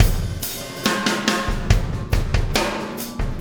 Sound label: Impact Crater Beat, Processed Drumkits; Sonic Foundry (Sony Creative Software); Processed Drumkits Zero Gravity Beats